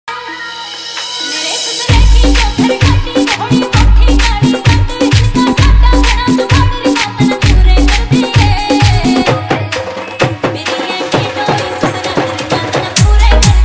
Hard Trance Vibration Mix

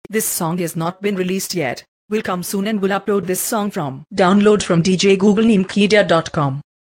Punjabi Dj Song

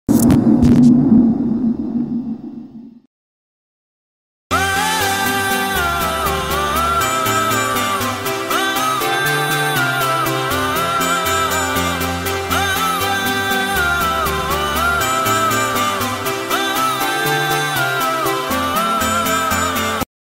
New Song

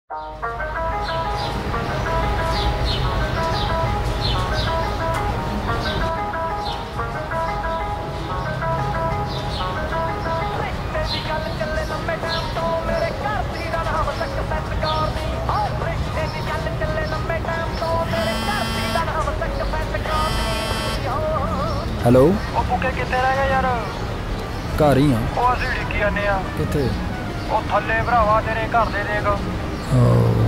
Punjabi Song

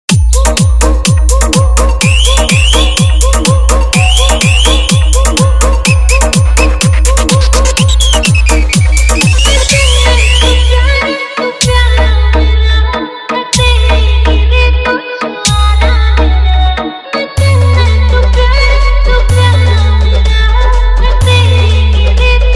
Song Dj Remix Hard Bass Vibration Mix

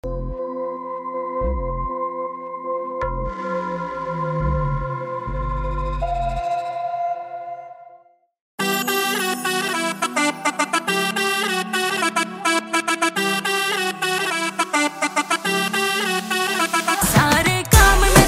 New Haryanvi Songs

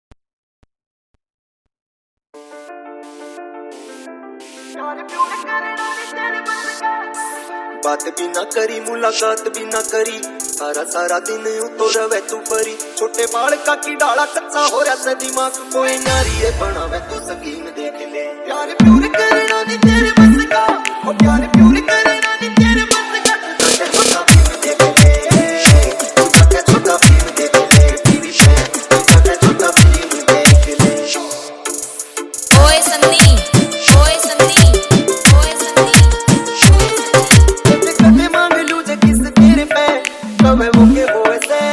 Remix Haryanvi Dj Song

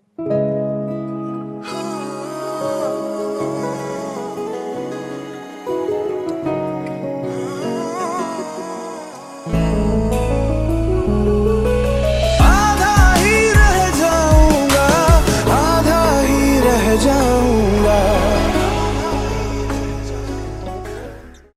Hindi Song

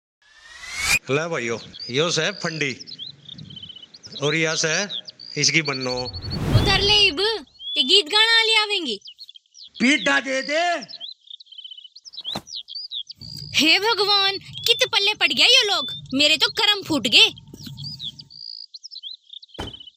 New Haryanvi Song

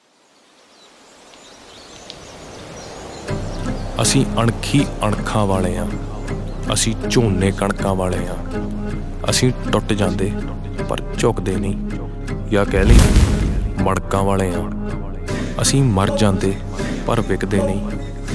New Punjabi Dj Songs

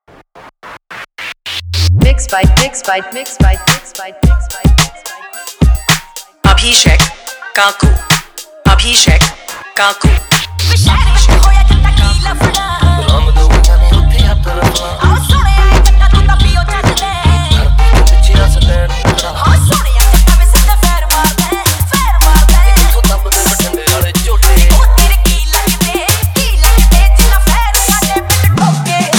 Hard Bass Remix Song

